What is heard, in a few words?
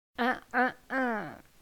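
dance female; nativevillages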